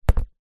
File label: pepper capiscum down on wooden chopping board